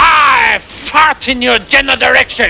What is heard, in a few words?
fart